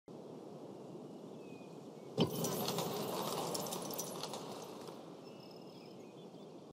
settlement selection; rome total war

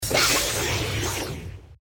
spell hitting target; baldursgate

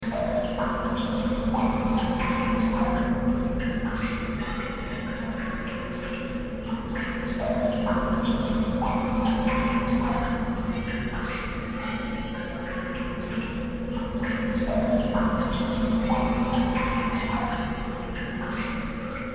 ambience overgrowth water; portal 2

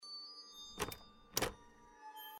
locked; The Cat Lady

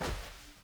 fs carpet medium; stanley parable

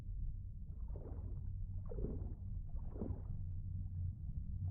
swim; gris

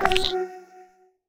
button synth negative; portal 2

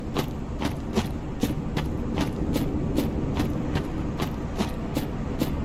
footsteps dirt; Dark Souls III

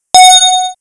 catch book; Pinocchio 1995 SNES; gamesoundopedia

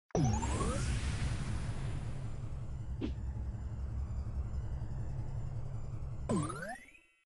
jetpack active; Subway Surfers

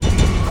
attack; rome total war

